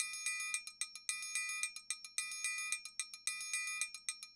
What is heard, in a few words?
TRIANGLE; Sonic Foundry (Sony Creative Software); Joe Vitale Latin Percussion